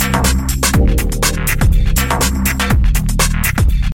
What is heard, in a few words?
keygen music; samsung